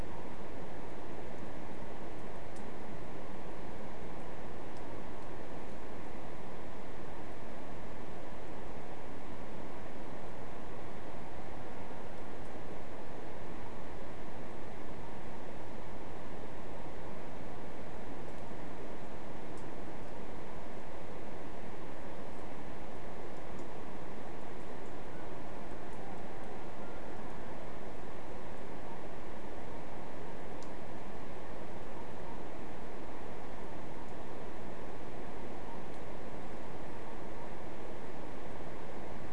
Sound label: no obvious biophony